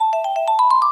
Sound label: Vibe Win Transfer; ShowtimePOG; ClubFusion